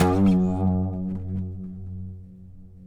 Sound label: PLK OUD; Roland - LA Composer vol. 3